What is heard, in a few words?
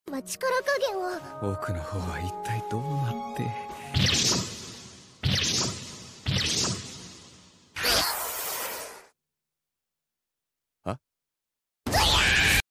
Chant Huh